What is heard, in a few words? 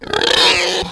green iguana die